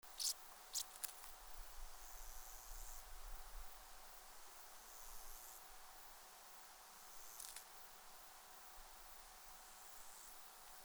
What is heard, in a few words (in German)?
Oedipoda caerulescens Balz, Thermenlinie